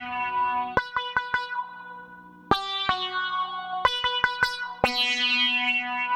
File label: Sitar; Sonic Foundry (Sony Creative Software) - Stylus Pressure Urban Grooves on Digital Wax; Cuz Baby